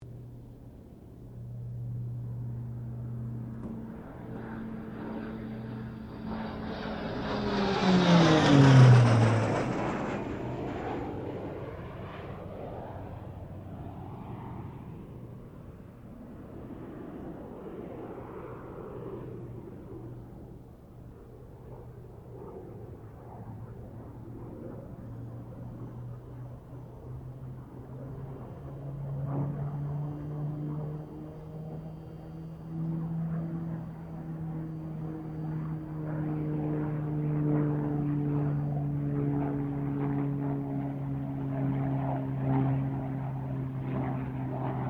1985 PC-9A PASS, PC-9 Military Trainer; Airplanes; Vehicles

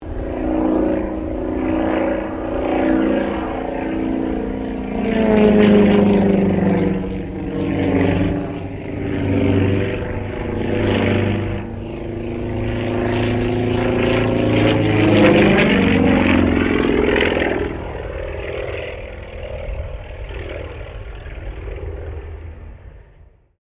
Sukhoi Su-26M acrobatic pass; SU-26m Acrobatic aircraft; Airplanes

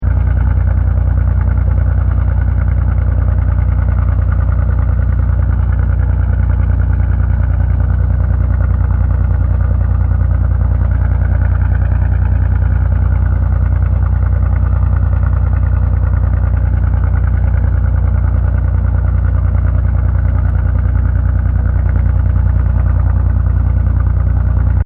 BOAT TUGBOAT IDLING CLOSE LOOP, Japanese Tugboat; Boats (Sound Effects:Vehicles)

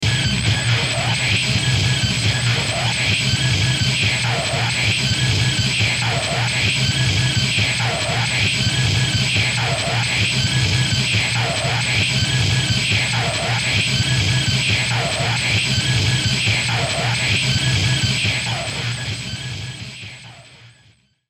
Distortion Musical Beep Swells; Blastwave

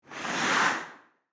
Ice Skates Blade, Scrape, Stop; Sports; Distant; Ice Skating

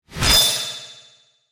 Flash Hit; BlastWave

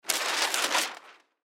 Easel Tear Sheet, Easel Tear Sheet Off; Office; Materials; Blastwave

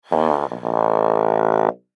Baritone Descend, Baritone Descend Sputter; Cartoon; Musical; Cartoons; Blastwave

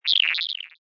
Digital Robotic Transmission, Scifi; Scifi Production Elements, Set 2

